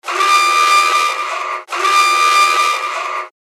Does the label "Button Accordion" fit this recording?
No